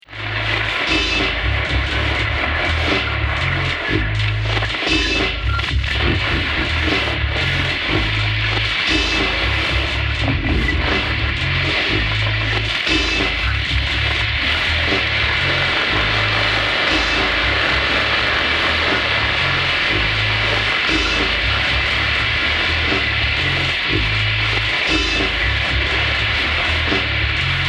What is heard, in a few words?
Rain Mud; North Dunrobin; Thomson